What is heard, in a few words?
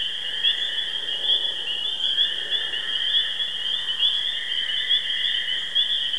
insects, Insect sounds 2; recordings from Disney theme parks and movies